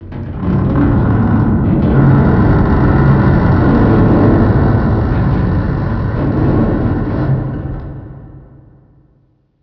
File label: ship creak medium; trek